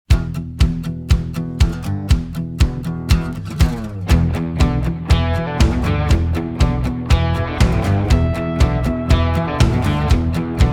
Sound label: The Lead Hits Deep